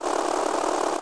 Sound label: electric motor small; electrical sparks